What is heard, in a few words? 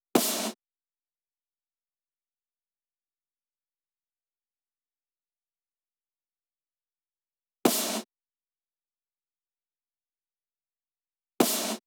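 128BPM Snare Adds; Essential Clubsounds Vol.5; Break the Chains; Root G#min; Drums & Perc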